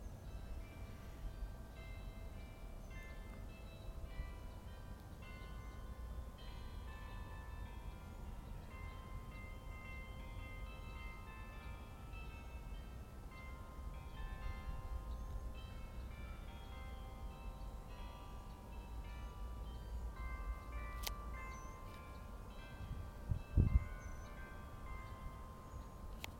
carillon

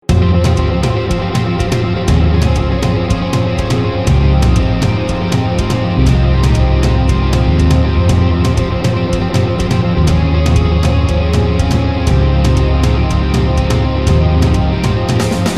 guitar sounding synths

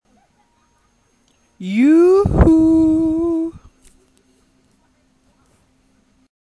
yoooo hoopo

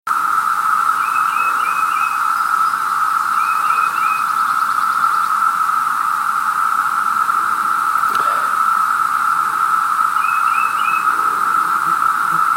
periodical cicadas brood II amplified

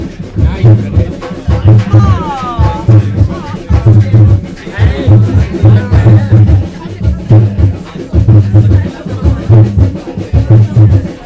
samba band play us onto the bus; oxjam brum; 11 bus

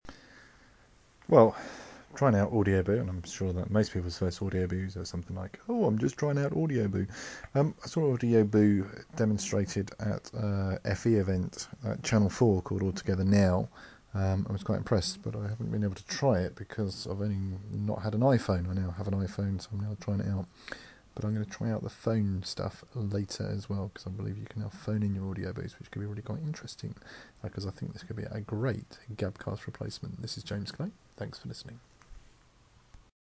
hmmm

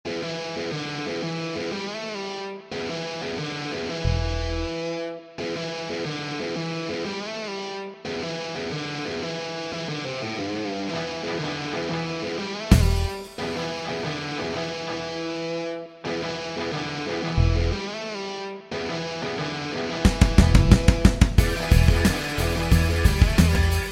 garageband bluesy

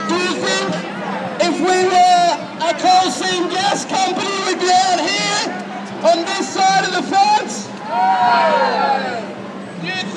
chanting at state parliament

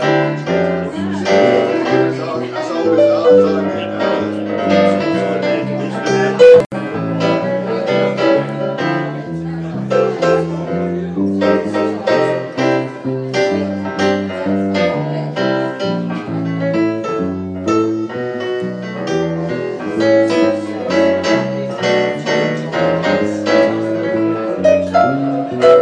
singing jazz live; the anchor in burwell cambridgeshire

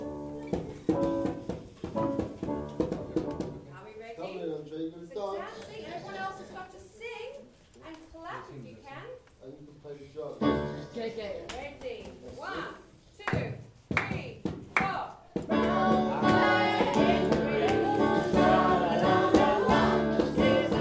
reggae songs

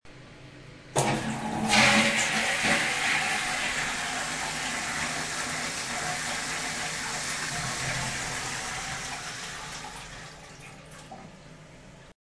flushed; with enthusiasm

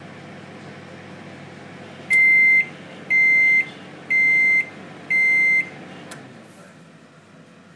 microwave

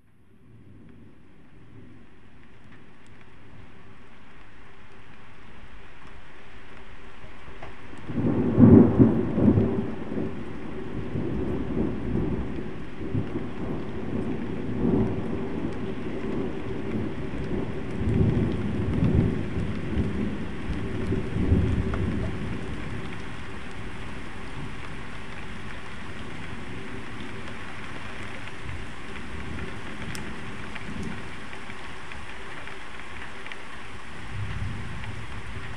thunder approaching

